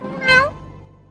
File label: meow